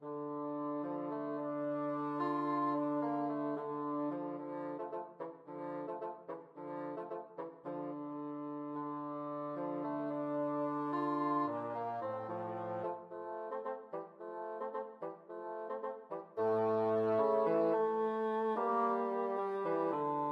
bassoon duet

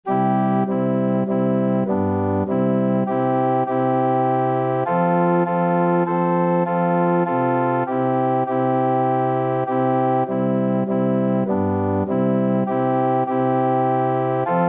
organ

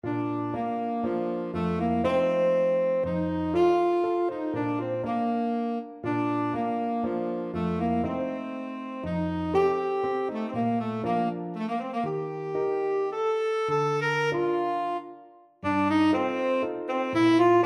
bella bimba, tenor saxophone

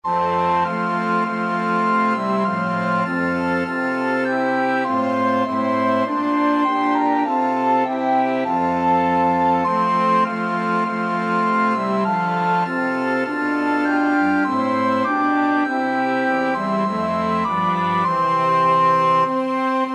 flexible mixed ensemble, 4 players

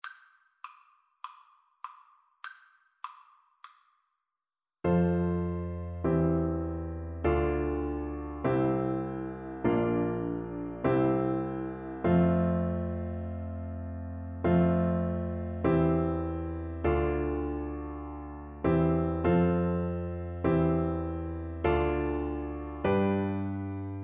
all hail the power, Trumpet

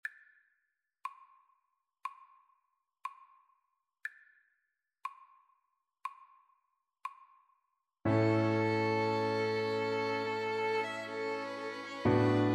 adagio and allegro in a major

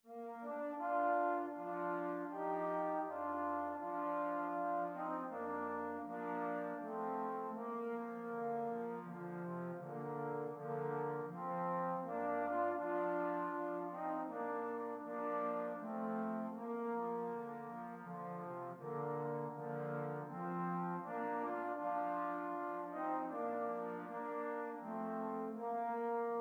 es wird scho trombone

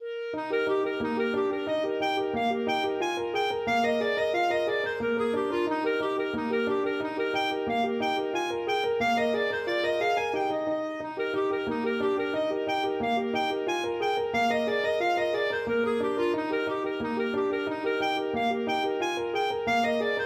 hornpipe, clarinet; cincinatti

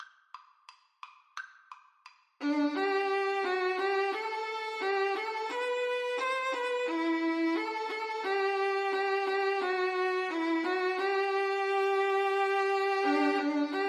Violin Duet

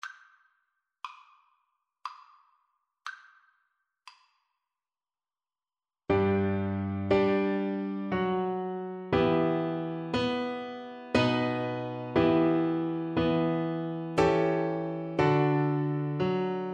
star spangled banner, Double Bass

